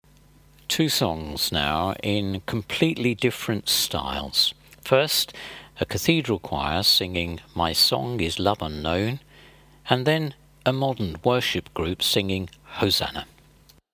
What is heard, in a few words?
Two contrasting songs